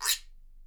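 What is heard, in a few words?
guiro, Percussion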